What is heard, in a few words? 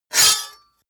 sword cut hard clank